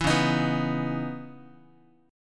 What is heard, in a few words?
C sharp Minor 9th Guitar; strummed; 7,9,6,9,x,x; 4A,4E,5A,5D,5G#,6C#; JGuitar